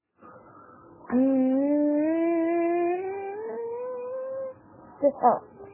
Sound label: c d e f g a b c all